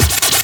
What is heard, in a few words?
Scratchy, FX; Vengeance Samples